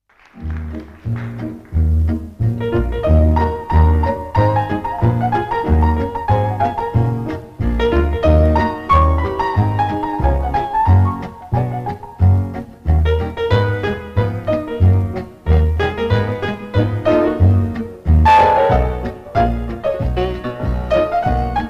instrumentalnoe trio

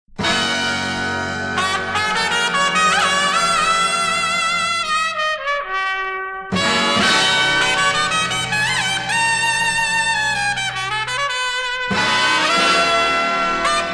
Мелодии трубы

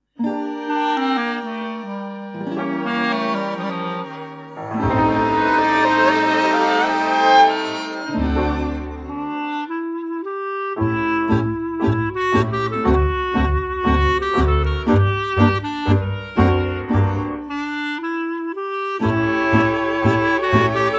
djaz orkestr

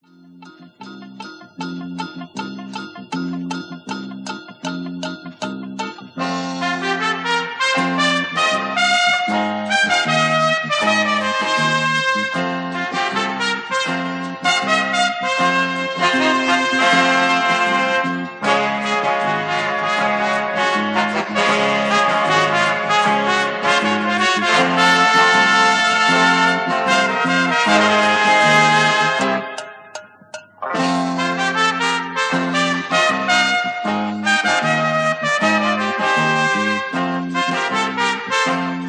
orkestr; Balkanton; Metronom

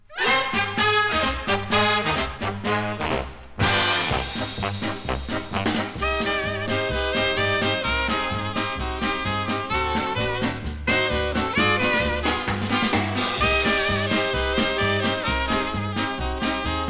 instrumentalnyiy ansambl; Melodiya

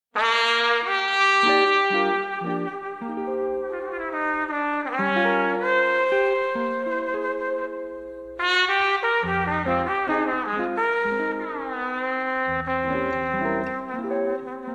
Мелодии трубы

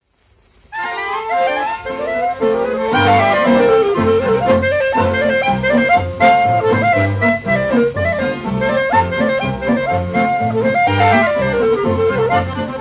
djaz orkestr

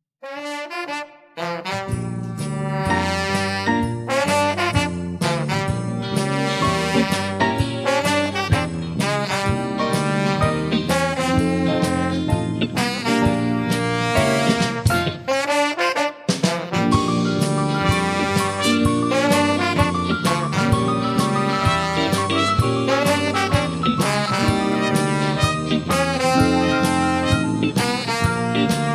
orkestr

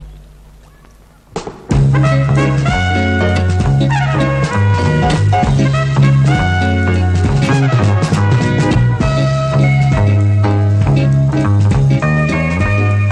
instrumental; к.ф. Бой с тенью 1972

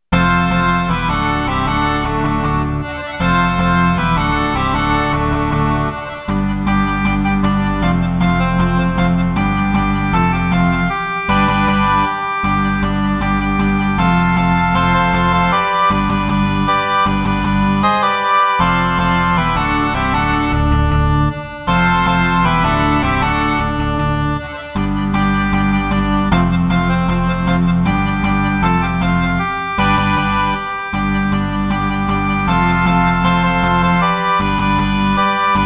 sheyk dlya dvuh akkordeonov

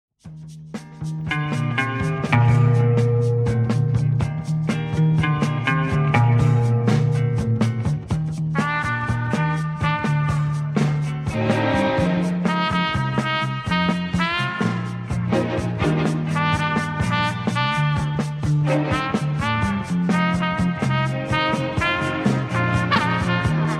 tantsevalnyiy orkestr

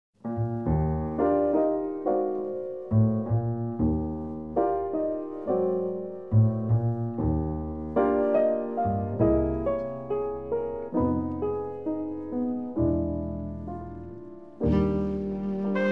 Мелодии трубы

